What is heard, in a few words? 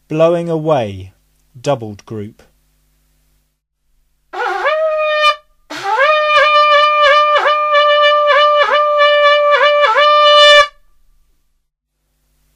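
blowing away, hunting horn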